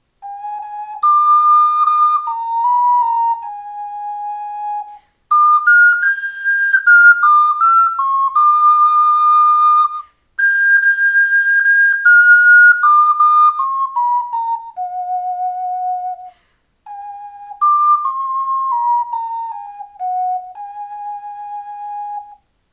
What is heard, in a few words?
ocarina